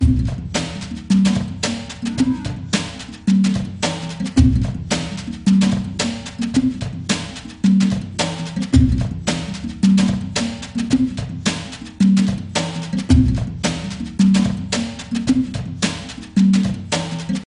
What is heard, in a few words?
Jungle Level